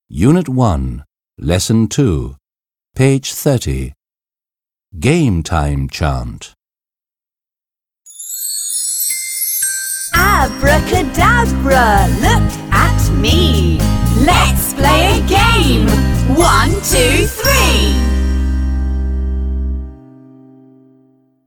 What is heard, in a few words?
Game Time Chant; Routine